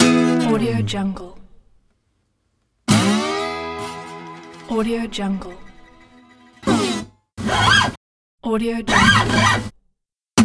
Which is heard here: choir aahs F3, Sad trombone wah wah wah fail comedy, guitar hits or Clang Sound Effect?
guitar hits